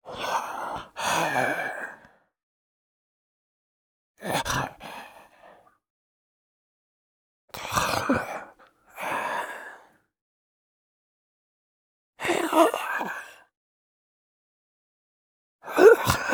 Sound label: ZOMBIE MALE CALM WANDERING BREATHING GRUNT GROWL